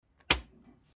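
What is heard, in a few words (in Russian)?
пачка денег на стол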